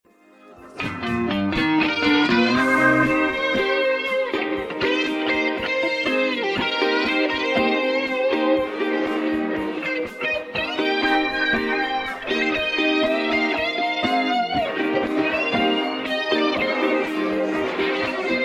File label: modulated outro solo